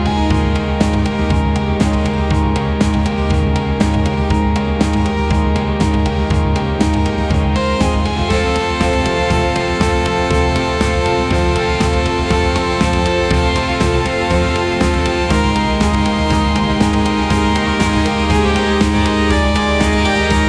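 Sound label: energetic anime mmorpg background, anime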